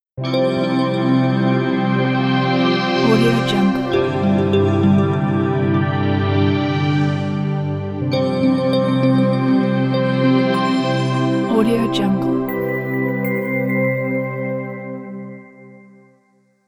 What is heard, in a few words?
bright logo